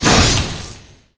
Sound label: Ice Bolt